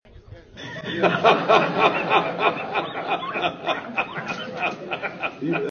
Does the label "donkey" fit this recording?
No